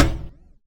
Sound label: impact Plate medium